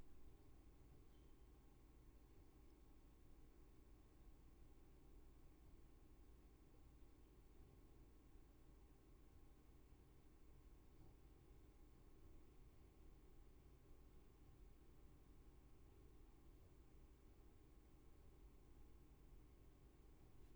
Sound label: room tone